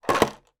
toy lego house with figures inside put down on table